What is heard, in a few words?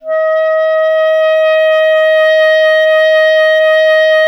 CL D#, Clarinet; Miroslav Vitous; Solo Instruments VOL-1